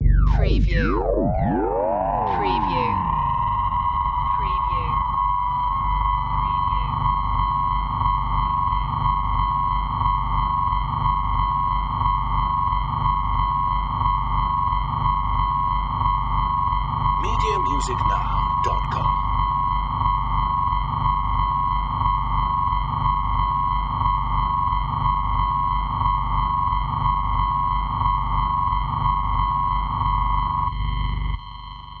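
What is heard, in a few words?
Alien Warning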